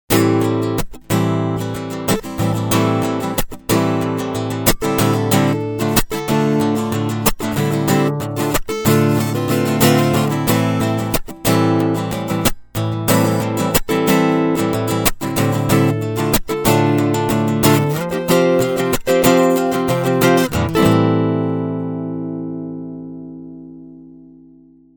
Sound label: strumming